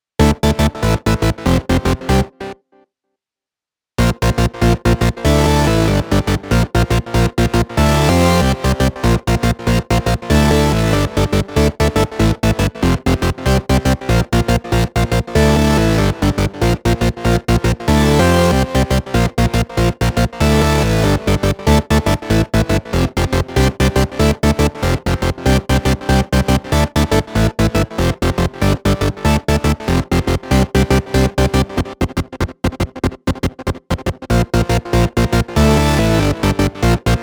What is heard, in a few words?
Basse Organ